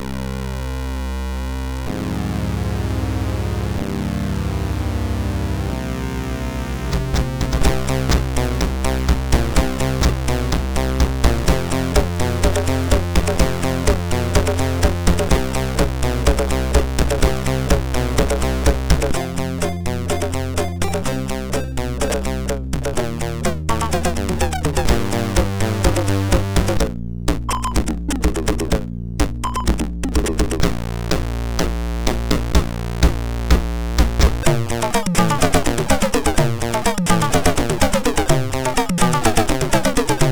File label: sid, MOS6581R4; Upside Sound